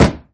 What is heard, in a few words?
bonus symbol barrel hover; Hungry Shark; Wazdan